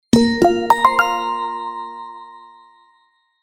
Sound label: Jumping on, Alcatel phone tones; OT-2012